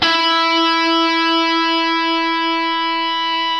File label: ROCK GUITAR